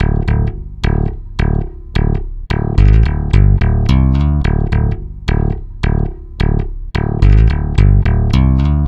BASS TASTER; Sonic Foundry (Sony Creative Software); Drums from the Big Room The Mixes; Demo Projects

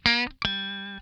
lick scale, funk guitar; Zero G - Funk Guitar

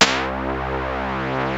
SPLIT; Rubber Chicken Software Sample Bank

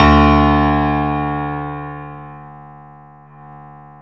PNO D2 R; USB Soundscan vol.51; House Side Of 2 Step; AKAI; PIANOS

